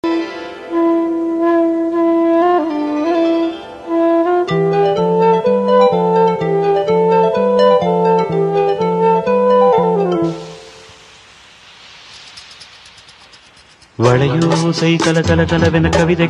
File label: trending music